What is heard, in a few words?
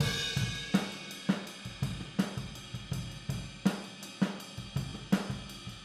Boogaloo Chorus Overhead ST, 82 BPM; Drums from the Big Room The Mixes